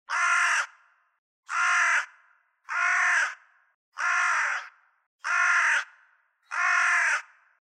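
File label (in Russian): karkanie vorony, crow cawing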